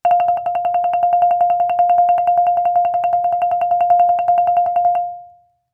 Marimba roll ff F5; MIS Pitches - 2014; Percussion

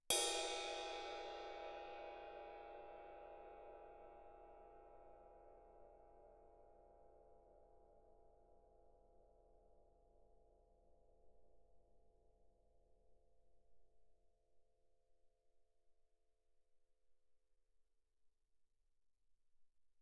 ride stick normal ff, suspended cymbals; Percussion

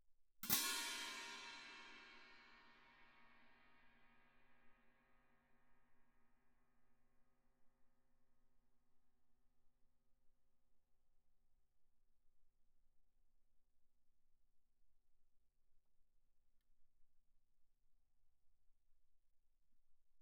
18 orch crash, germanic, mf, crash cymbals; Percussion

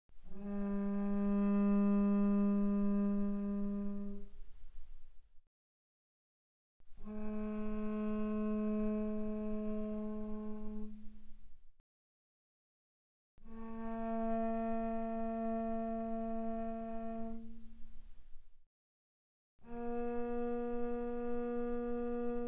Cello arco pp sul G G3 G4; Strings

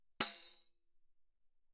tambourine normal ff; Percussion

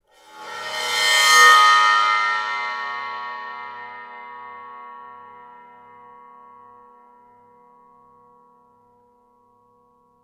crash bow, Suspended Cymbals bowed; Percussion

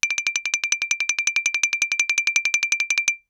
Xylophone rosewood roll ff D7 stereo; MIS Pitches - 2014; Percussion

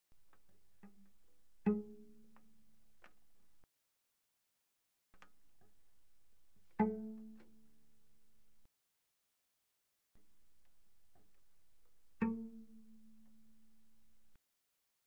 Cello pizz pp sul C Ab3 Db4; Strings

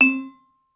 Vibraphone dampen ff C4 stereo; MIS Pitches - 2014; Percussion

